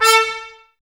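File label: Brass trumpets A, Mute; Miroslav Vitous; Woodwind Brass Ensemble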